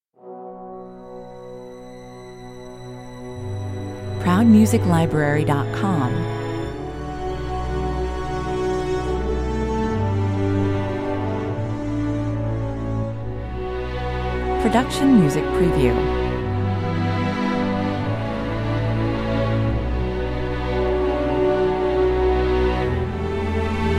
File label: no staccatos and percussion